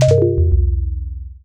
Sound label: Hardware Failure; Emberfall